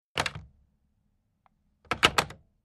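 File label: Telephone Pick Up Hang Up Heavier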